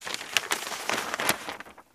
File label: Newspaper Page Shuf; paper mvmt